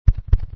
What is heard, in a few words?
heart beat